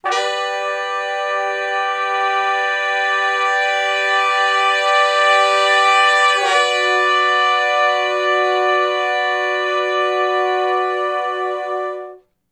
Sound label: Slo Minor Blus Chords ((IV,IV,I,I) (B); Sections; Crimson Blue and Fabulous Horncraft 4 RnB; Horncraft for R&B